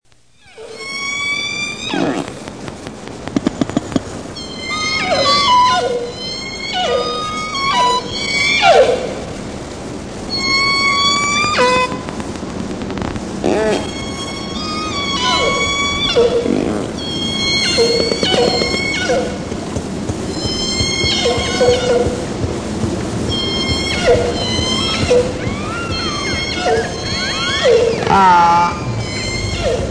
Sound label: resident dialect orca